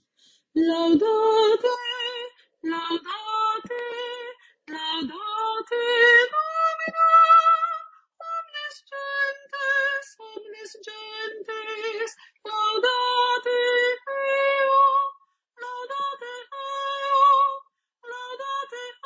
Laudate Soprano 1 e 2

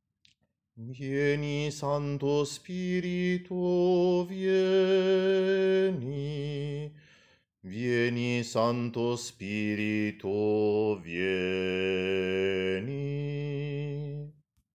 Vieni Santo Spirito Bassi; coro diocesano

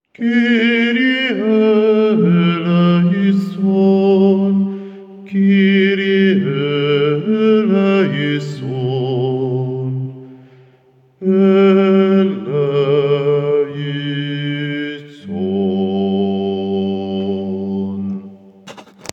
bassi

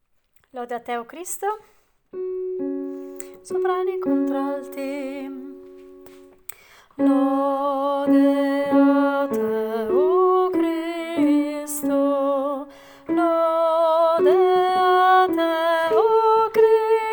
lode a te contralti

